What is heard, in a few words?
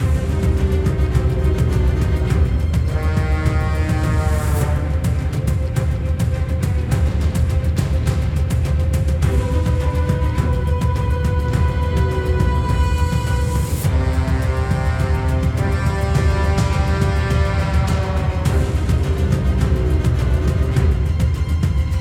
Combat Normal